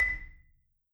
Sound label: B5, Marimba; hard F; Sampleheads - Dave Samuels Marimba & Vibes